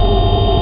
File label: air; Amiga Soundtracker Sample Packs